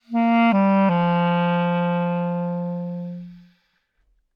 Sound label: clarinet phrase Bbmaj 100bpm; live clarinet phrases; bespoke - Floral v.2